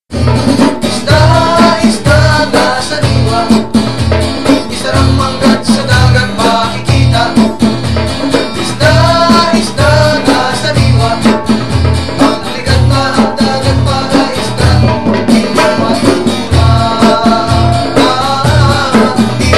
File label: Jingle; Isda